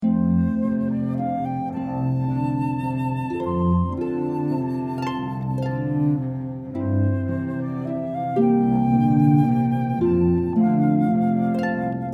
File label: harp flute cello